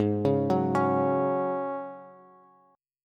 Ab 7th Suspended 4th down Bass, Ab7sus4 chord; arpeggiated

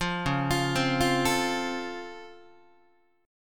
Db Augmented Major 7th, down, Guitar

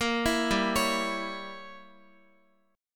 G Diminished 7th, Guitar; Gdim7 arpeggiated; x,x,10,9,7,9

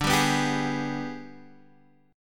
Ab Minor Major 7th Flat 5th

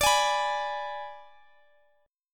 B Minor 6th D

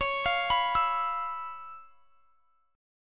Db 7th Sharp 9th Db down, chord shape; Db7#9 arpeggiated

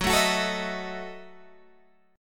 Gb Minor 13th Gb down Guitar Open G

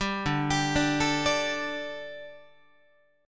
D-Suspended 4th Guitar, Dsus4 chord; arpeggiated

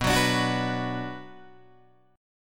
B Minor B Guitar Open D

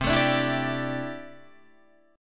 C 6th Add 9th C down Guitar Open D

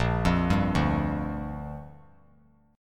A sharp 6th; arpeggiated; JGuitar

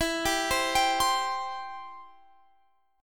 B sharp Major E

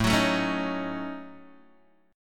A 6th Flat 5th, Guitar, DADGAD; 7,9,7,6,6,x

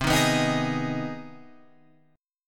C Minor Major 7th Sharp 5th chord, Guitar Open F; CmM7#5 strummed; JGuitar

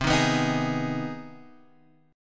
C Minor Major 7th Sharp 5th chord; CmM7#5 strummed; JGuitar

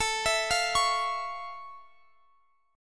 F Augmented Major 7th, chord

